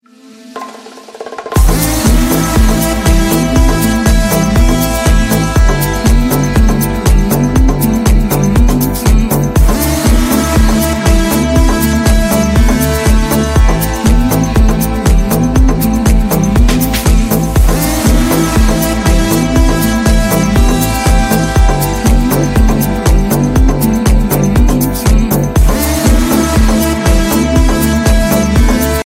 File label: galaxy ringtone